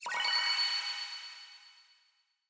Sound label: win; gambling (coinflip & slots)